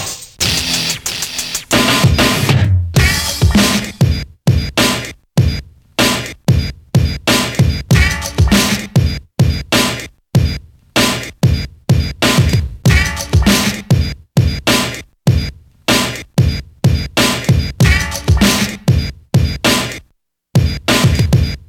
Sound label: breaks; Super Duck Breaks